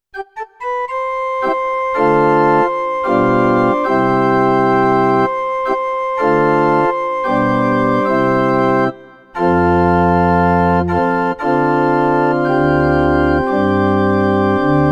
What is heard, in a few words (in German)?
Yamaha NP32 Orgel